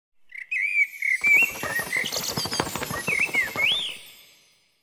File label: Field Mat Tap; Yu-Gi-Oh! Master Duel